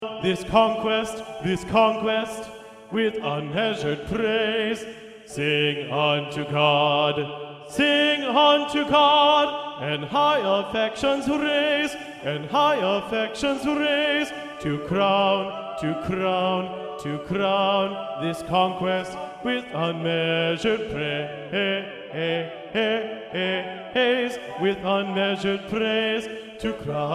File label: sing unto god bass; honor choir